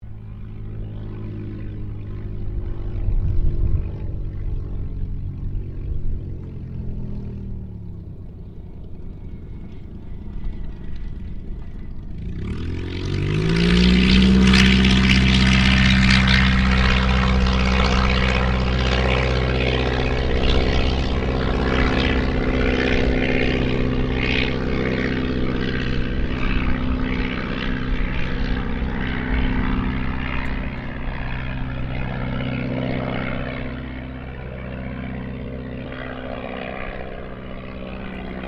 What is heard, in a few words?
AIRCRAFT DH82A DEHAVILAND TIGERMOTH TOUCH AND GO INTERIOR LOOP; RAAF Tigermoth; Airplanes; Vehicles